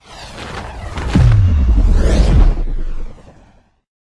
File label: supersonic boom; Superman